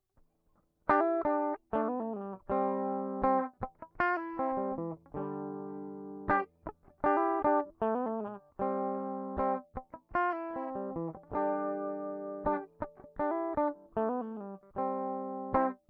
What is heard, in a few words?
Guitar